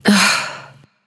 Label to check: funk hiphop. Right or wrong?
Wrong